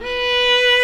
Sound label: Vla B3; Digital Sound Factory Virtuoso